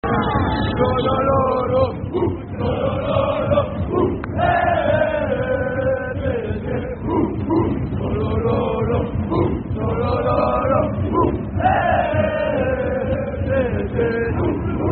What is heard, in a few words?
lalala ou ouh ouh; psg